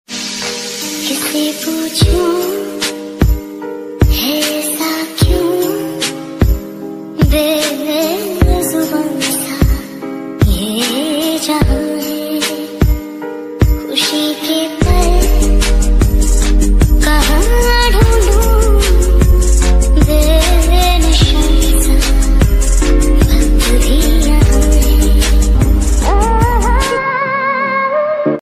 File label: Female Sad Song; ringtones